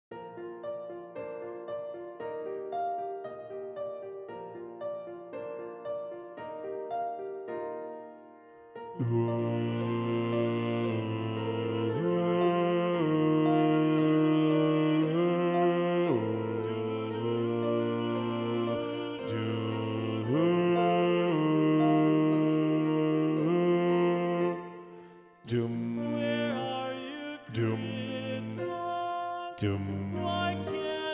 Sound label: Bass 2